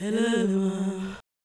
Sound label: Fem Vocal 128bpm G FX, African Voices